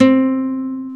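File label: GUI NOTE, acoustic guitar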